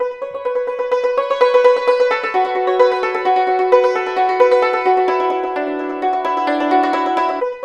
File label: YNCHIN 90 D; INIS - Opium